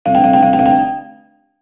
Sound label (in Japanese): システム音